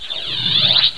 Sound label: dispense; physioex9